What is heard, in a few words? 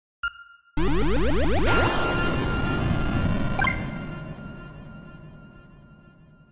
Unlock; Beat da Beat